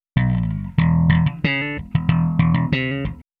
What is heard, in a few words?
bass